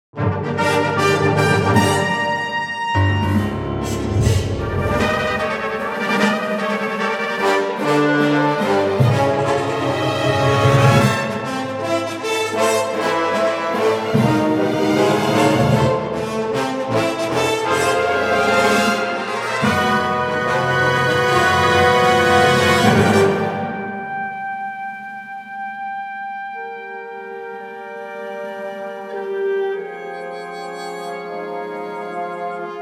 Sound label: Brass